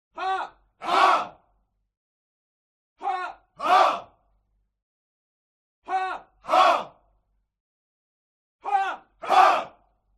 CROWD Military Shout "Ha"; HUMAN; smartsound

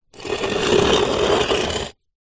foley concrete block scrape on bricked ground

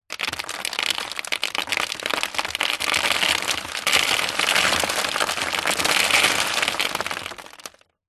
stones small debris pour tip into cardboard box; foley; zapsplat

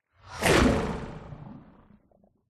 science fiction movement slimy weird; zapsplat

